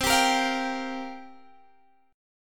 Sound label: C 7th Suspended 4th, guitar